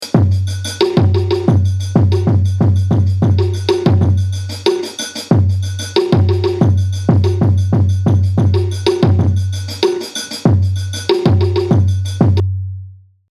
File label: hip hop son